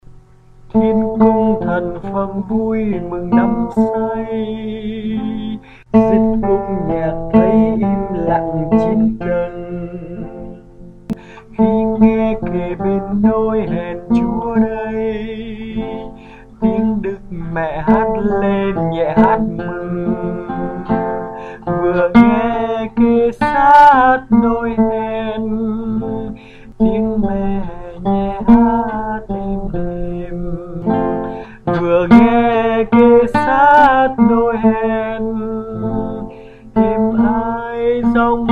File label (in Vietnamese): Hội Nhạc Thiên Quốc, Alto